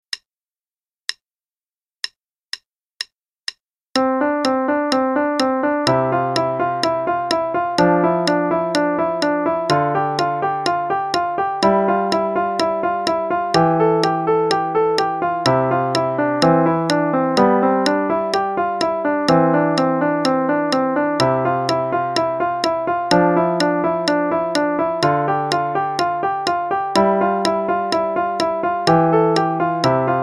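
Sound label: Play-a-long, Exercise, quarter note=126; Kohler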